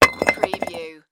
Brick or Cynder Drop

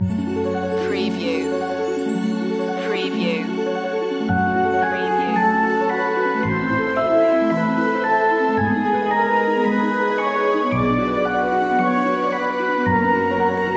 sci fi fanfare

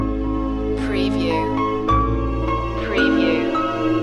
orchestral romance loop

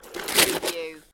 Snow and Ice, Ice scrape small

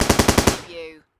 Machine Gun M240B

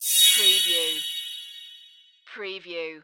Magical Shimmer Pixie Dust; Magic / Spells / Fantasy

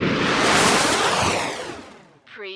Magical Projectile Whoosh

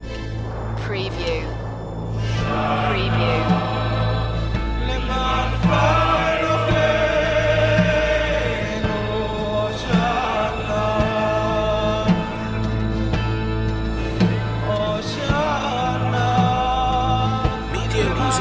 hosanna in hebrew

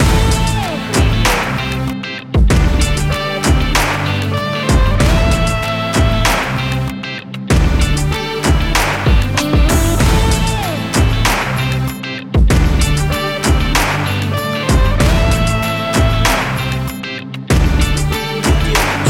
lavish lifestyle loop

